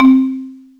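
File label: MARIM, percussion; AKAI S-Series CD-ROM Sound Library VOL-8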